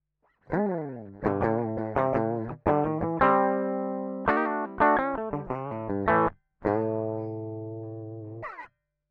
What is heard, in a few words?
gtr D.I.